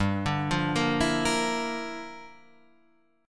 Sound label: G Minor 13th, chord; Gm13 arpeggiated; JGuitar